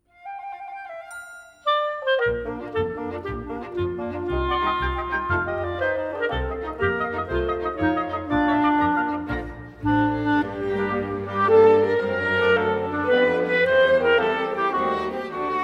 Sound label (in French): pour chanter a lopera instrumental melodie